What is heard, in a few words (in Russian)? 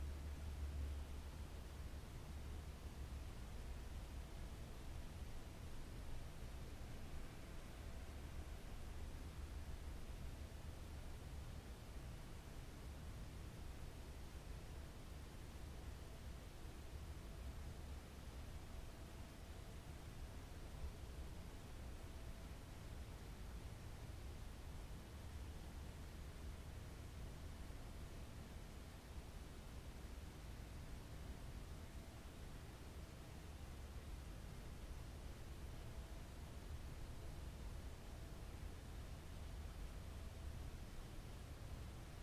garmonicheskij ladnij lyogkij shum raboti zavoda